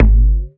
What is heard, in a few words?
Perc, Dubstep One-Shot Drums